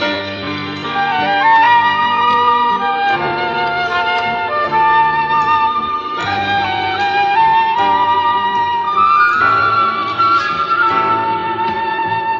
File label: flute, Loops